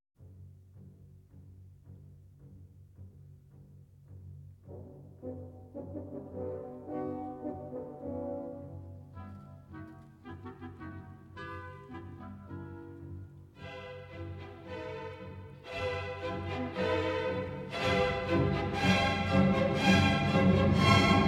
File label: Cello Concerto in B Minor; Jacqueline Du Pré; Haydn